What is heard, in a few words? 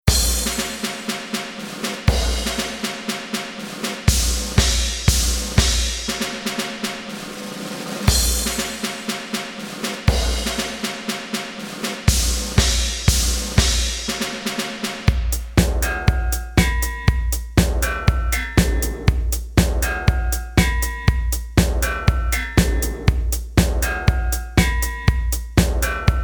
lost glasses, only bass